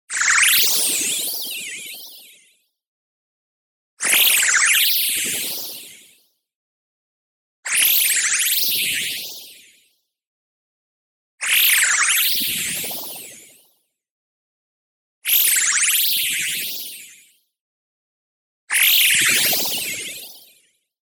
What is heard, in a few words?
movement fast, molecule flutter fast; boom library; Anime Essentials; DSGNMisc